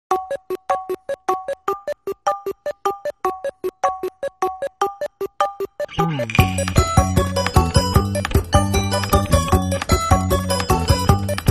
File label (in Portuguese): musica de brinquedo